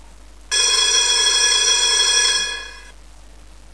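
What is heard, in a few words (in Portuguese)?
Toque Metro do Porto para telemóvel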